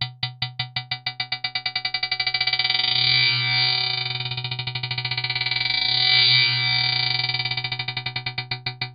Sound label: rolling